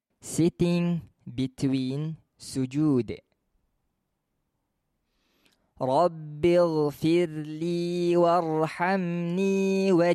sitting between sujud

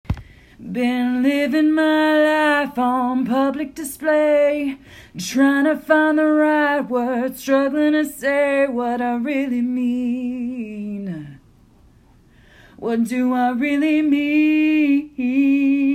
Country song

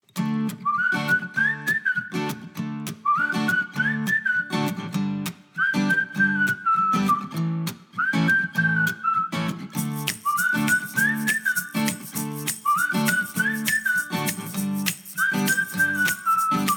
Positive Promos; Positive Promos 2

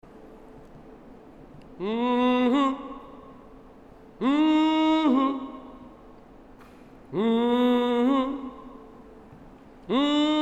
Mmm-hmm

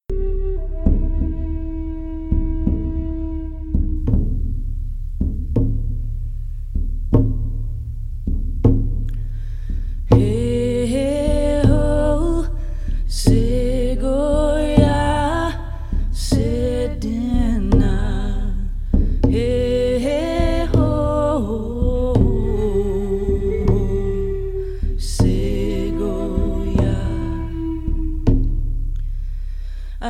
Athabascan Lullaby

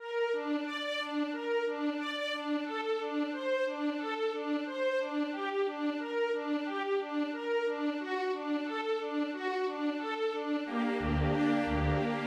Raindrops Full Strings

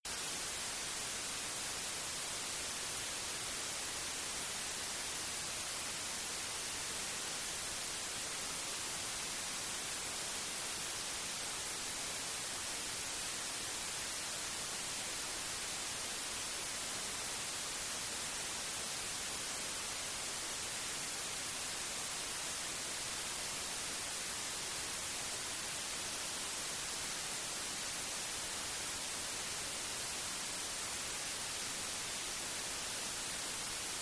plain white noise

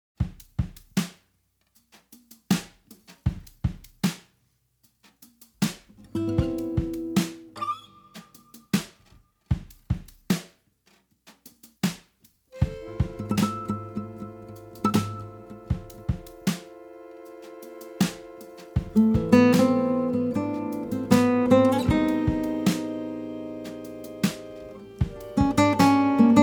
Maschine

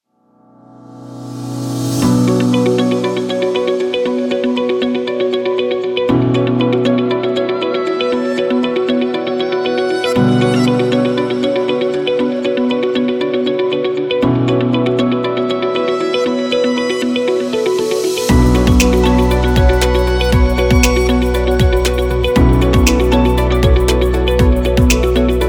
Upbeat

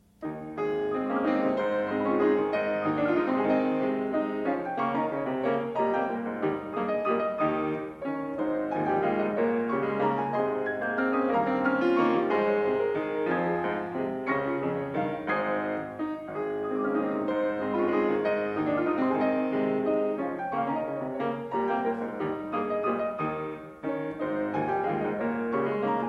Waltz in A Flat Major

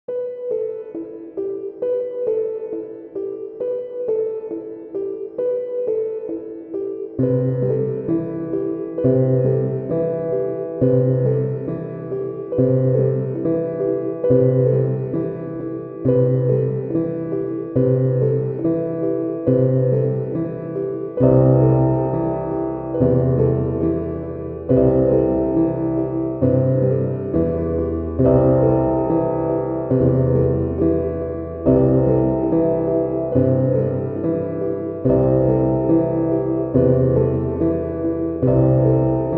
Piano Layers

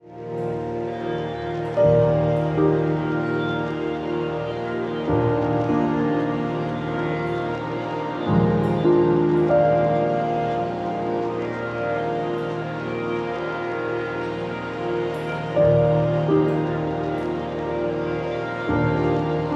ROMANTIC

